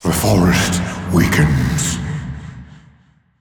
Taking Damage; Paladins; Grover

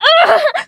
VO Inquisitor Heavy Wound; battlerite

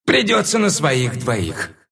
pain; Monkey; dota2

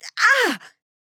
Grunt Hit; Inara

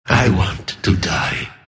oracle death

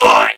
pain

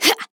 Grunt Attack; Maeve; Paladins

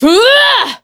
Metal Warden Heavy Attack; battlerite

